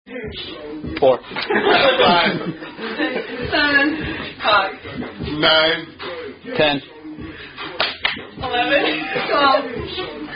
Drinking Games Slaps Aka 21

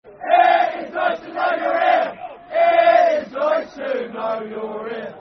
Football Chant (Soccer Song); It's Nice To Know You're Here; Milton Keynes Dons Mkdfc

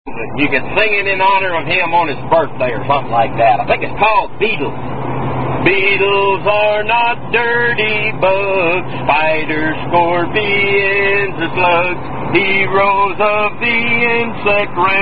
Unknown Camp Song; Beetle Bug Song

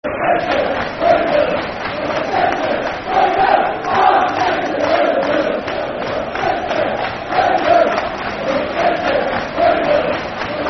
We Love You City, Football Chant (Soccer Song); Bradford City Bcfc

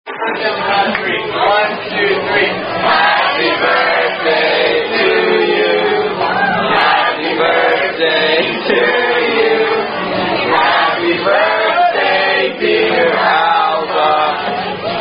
Happy Birthday (Standard) Sung By Wait Staff; Chili's

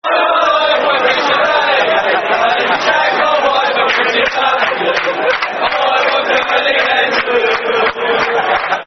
Football Chant; Christian Dailly; West Ham WHFC; fanchants

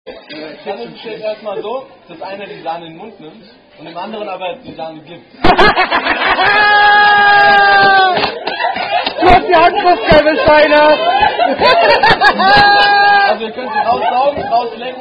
German Drinking Game; Lustiges Trinkspiel; ''blow Job'' German Drinking Game

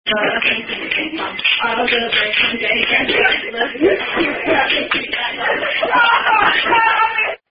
Hand Clapping Game

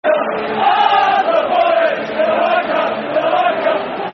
Football Chants; Artur Borac; Rangers GRFC

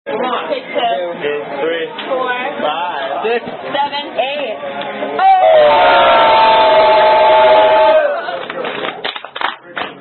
Drinking Game; Zoomie Zoomie; Zoomy Zoomy Drinking Game

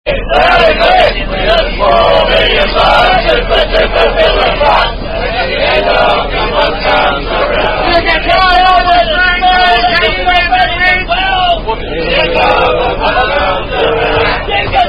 Rugby Song; End Of The Month Rolls Along

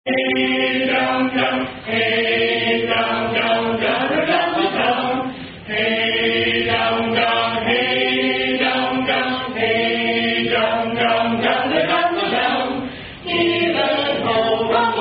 SCA Song; Hey Downe Downe - Leafy Greens & Mutton

